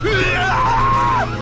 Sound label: screaming; htf flippy